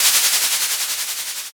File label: pen